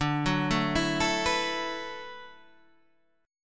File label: D 6th Add 9th D, Guitar, Standard; D6add9 arpeggiated; JGuitar